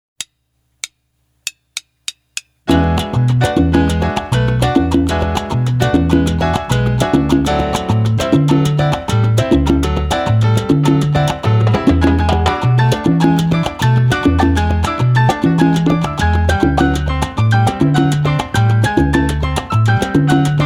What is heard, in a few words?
Guaracha Bass; Oscar Bass Book